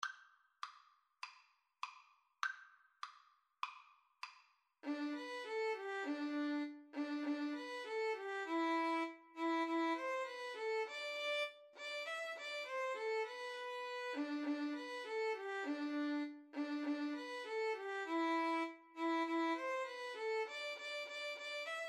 jingle bells violin duet

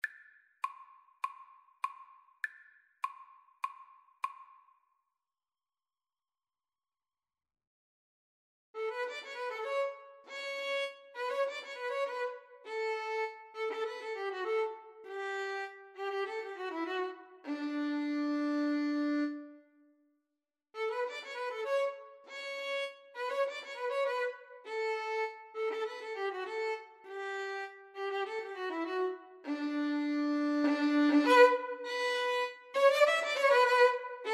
violin cello, Violin-Cello Duet; neopolitan

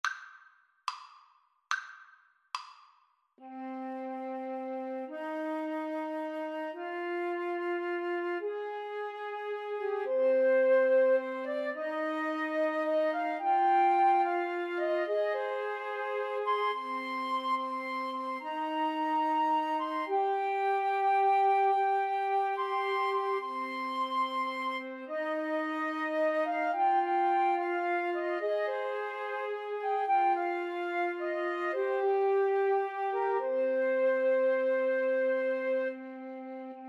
house of the rising sun flute trio

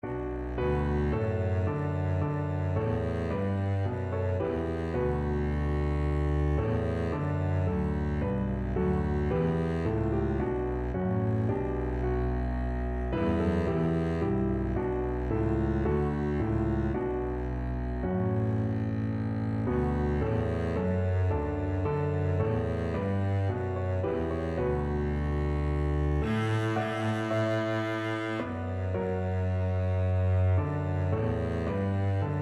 emmanuel, double bass

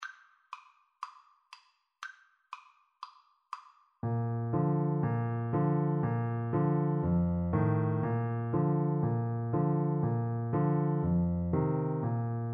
yankee doodle, Flute

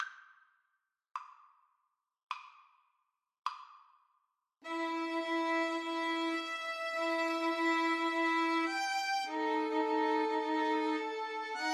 four seasons VLN TRIO

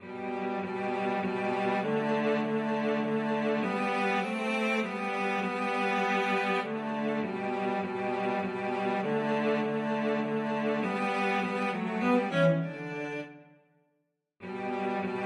duos menutto cello duet

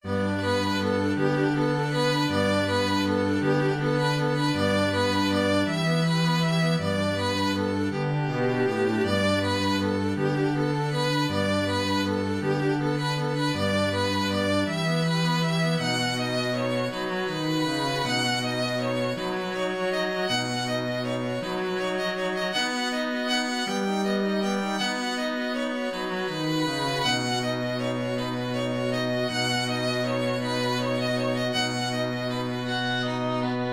morning violin cello

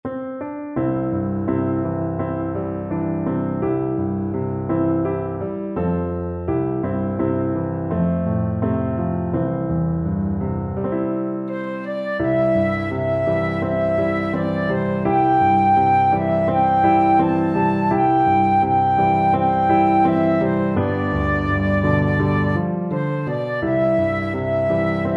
bantry girl flute

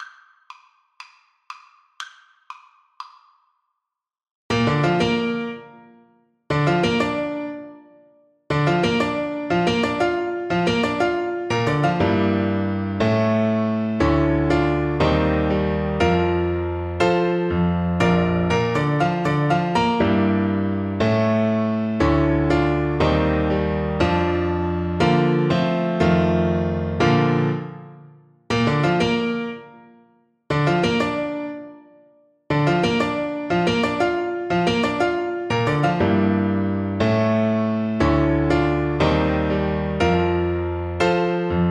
Saxophone

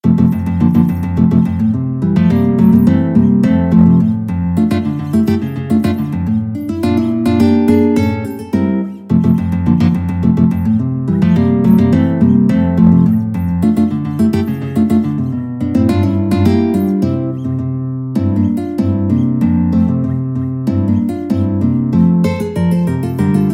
Contradanzas, guitar

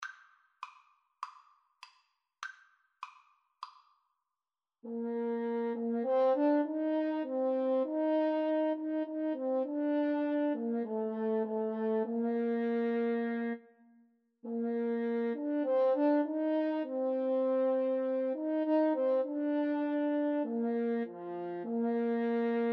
Clarinet French Horn Duet; How Great Thou Art

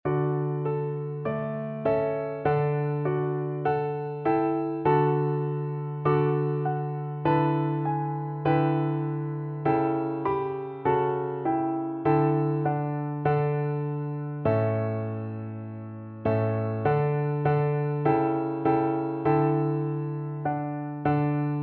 piano duet

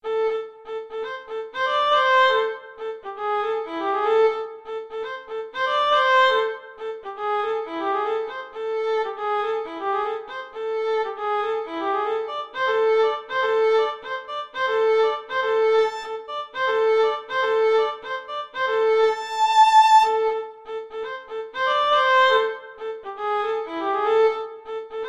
Funk Study, violin

